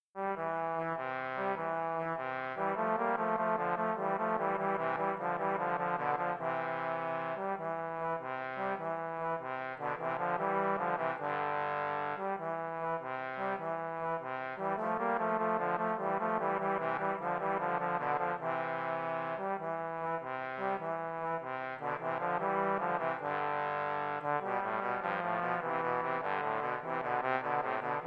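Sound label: trombone duet; der kuckuck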